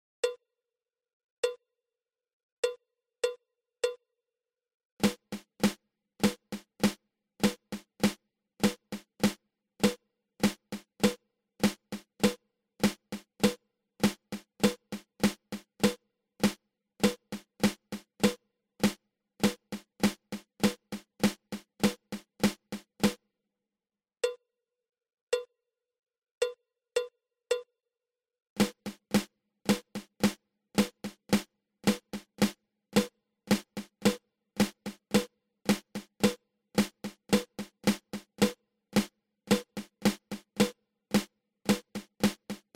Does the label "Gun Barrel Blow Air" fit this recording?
No